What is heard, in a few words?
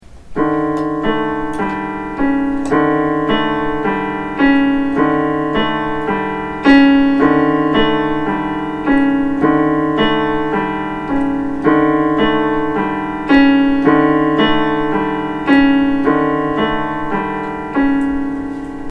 Ostinato; Discipline